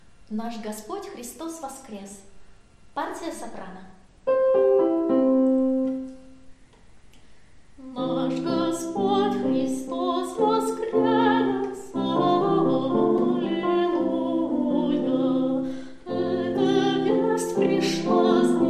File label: soprano; Nash Gospod Hristos voskres